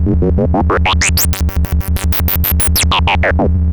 Moog G3; Hollywood Edge; Giorgio Moroder Rare Synthesizer Collection; MLTI.MOOG

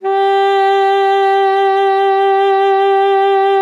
G4, SAXOPHONE; Session Instruments; Best Service ProSamples vol.42

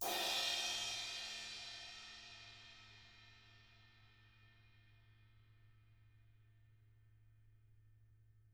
Drums, BRUSH; DW

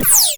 high burst, Science Fiction; E-MU Producer Series Vol. 3 – Hollywood Sound Effects; Columns

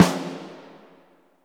SNR SONAR, Orch Snares

